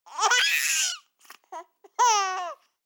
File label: Baby Laugh